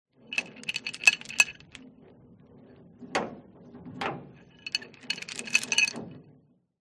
industrial chain shake rattle wobble against hollow metal